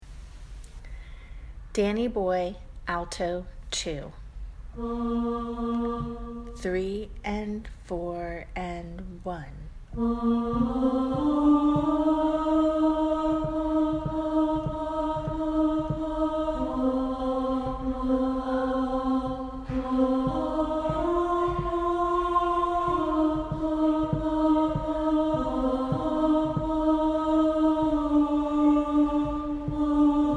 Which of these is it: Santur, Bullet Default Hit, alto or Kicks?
alto